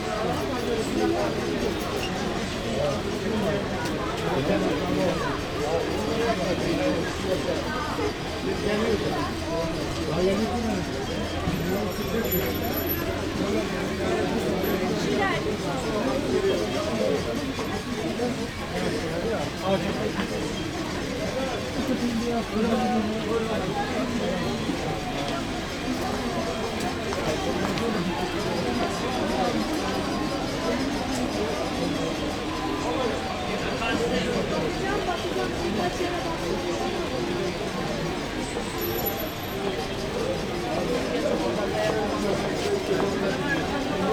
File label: market ambience